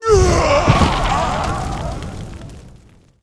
dark evil wizard dead, monster

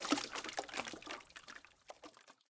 sewer; ruina

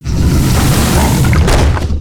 wolf deth

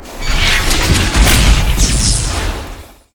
robotboss, monster

